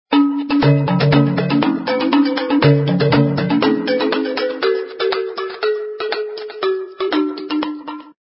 Ring; BQ Russia; BQM-1404-Beijing

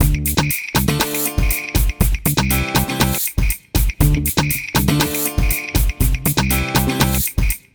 Start, ringtones; Explay; Neo

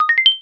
Video Start; Acer neoTouch-S200

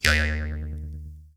Noti Karinding; Ubuntu-Touch-16.04-OTA-5; notifications; Canonical

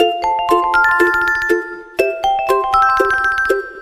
Heartbeat, ringtones; Elephone U Pro

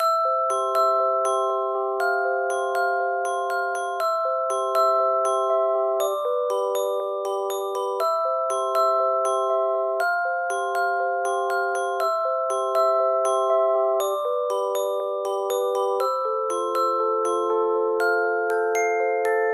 Positive One, ringtones; Yota Yotaphone 2

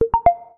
notification; Infinix XOS-12

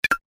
Baseball Result Hit, Game Sound; LG GD900 Crystal

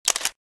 cam snap; LG Optimus Hub E510

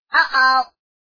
Game Over; BQ Russia; Jump Ladder

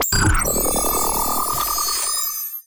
notifications; RED; Hydrogen-One

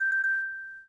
Timer; LG; T500-Ego

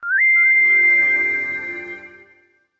notification; Coolpad

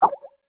message sent; Netgear SPH101 Skype WiFi Phone; ringtone

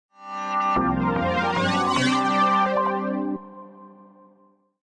Start up; LG KC910 Renoir

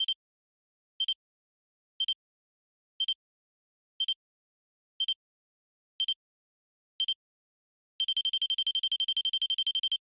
selftimer; FCNT Rakuraku F53 E

